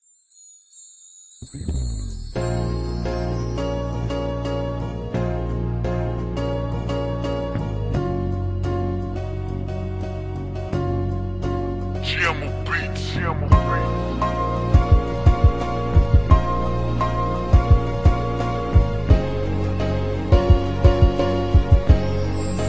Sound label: g-funk instrumental